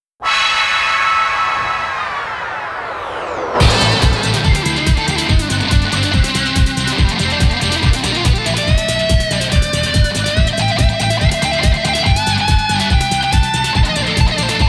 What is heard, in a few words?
Guitar Solo Version